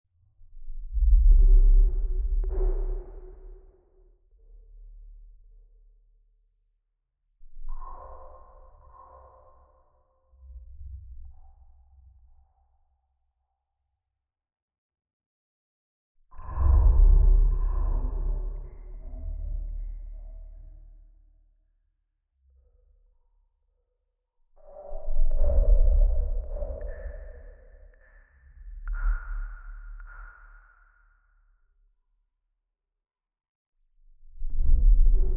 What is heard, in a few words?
Whale Combat